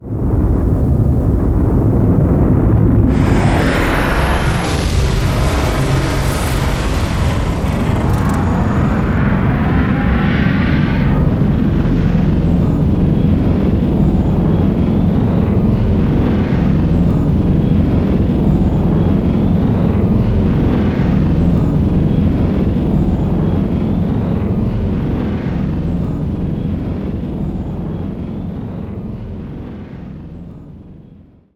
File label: rocket launch